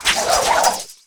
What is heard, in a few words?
Swish Twin Sword Twirl; Weapons